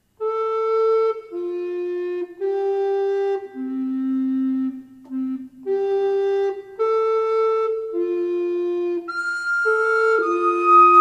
Variations, recorder; Westminster

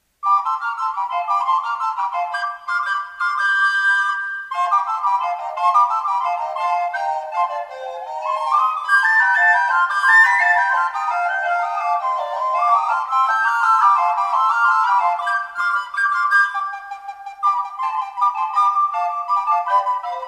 Fanfare a 3, recorder

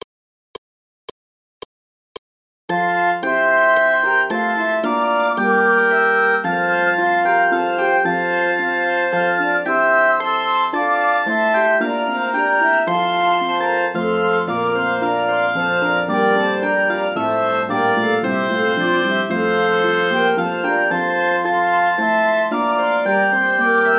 Courante de Wustrow all, recorder

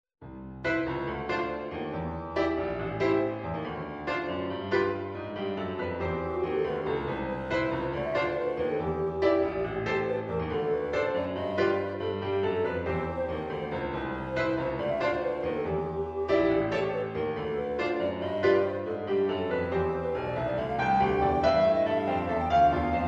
Swinging Xmas Carols for Treble Recorder Piano

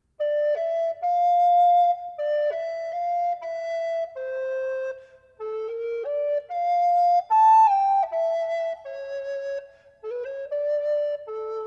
Canonic Duet two voices, recorder

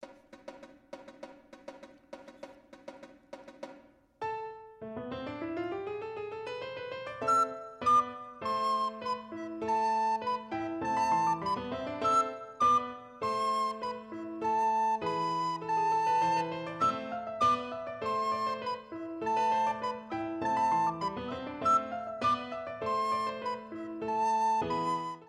Sunburnt Mirth for beginning recorder

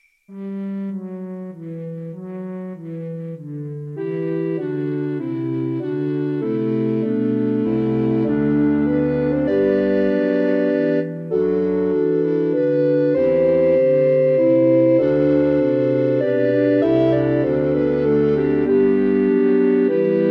Evening Hymn for Recorder ORCHESTRA; Purcell

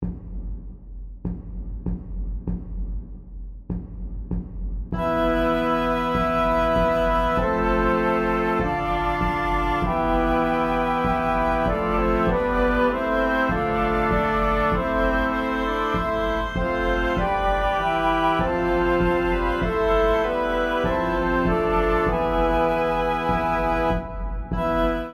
Mille regretz all; Susato

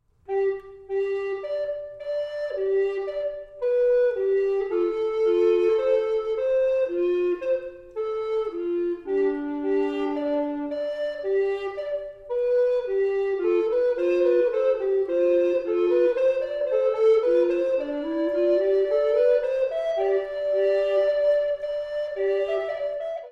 2 Tenors Talk, recorder; Angevine

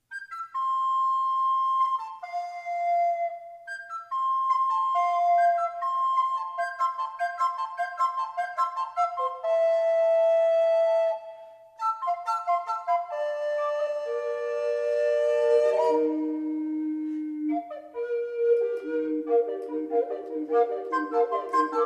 Fantasy, recorder; Schenectady

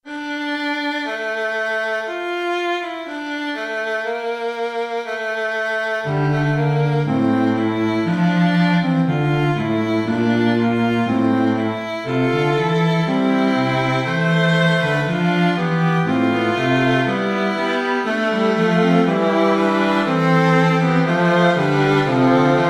Fugue, recorder

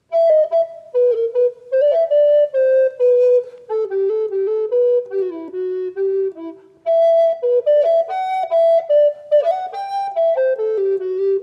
Canonic Duet, recorder

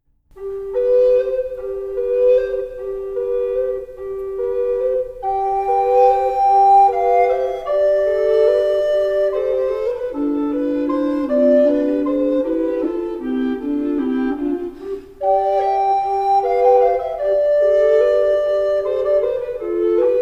Wombat Waltz

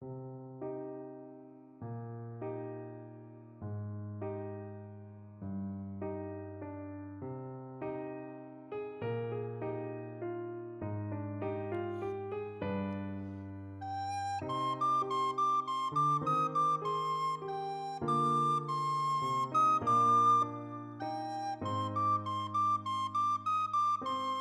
for beginning recorder

